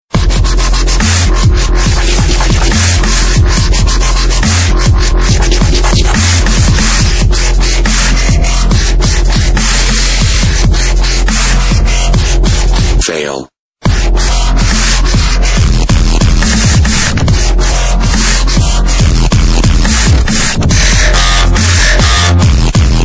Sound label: Dubstep